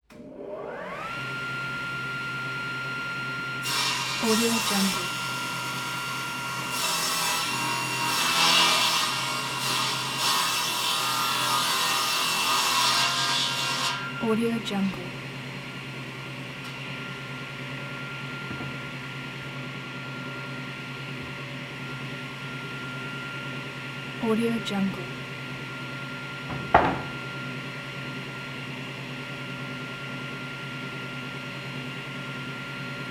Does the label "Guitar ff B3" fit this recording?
No